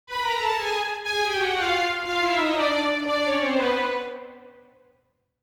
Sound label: Cartoon violin string